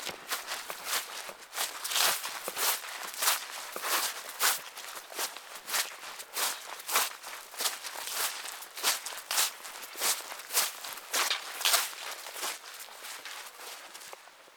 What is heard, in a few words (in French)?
BRUIT Pas herbe